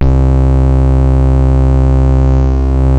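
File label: MOOG MODULAR C2; Hollywood Edge - Giorgio Moroder Rare Synthesizer Collection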